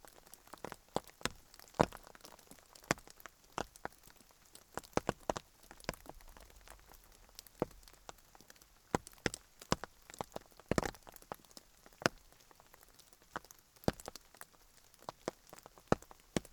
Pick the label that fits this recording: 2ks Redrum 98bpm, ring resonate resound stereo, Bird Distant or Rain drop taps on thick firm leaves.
Rain drop taps on thick firm leaves